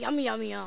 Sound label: mouth